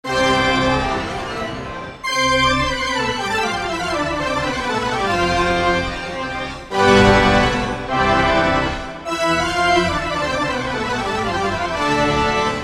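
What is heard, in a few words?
Drunken Orchestra, V-Synth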